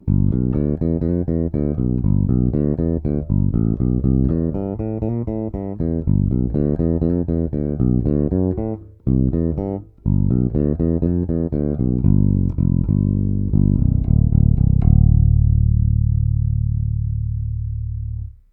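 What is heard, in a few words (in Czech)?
Warwick RockBass Infinity 5 Fretless, Thomastik JR345, dvojcívka sériová - jednocívka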